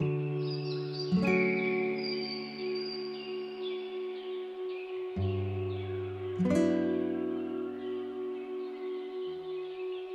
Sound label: remix